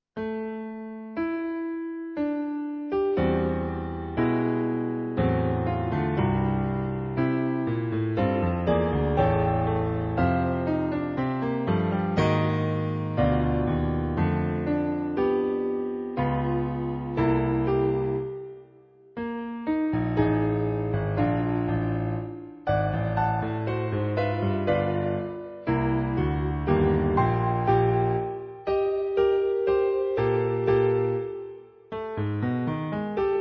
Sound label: Piano folk